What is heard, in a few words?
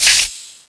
flame pshh; weapons